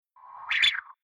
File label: Space SciFi Robot Digital Whip Swish Spaceship Transformer; Sound Design; Tom Hutchings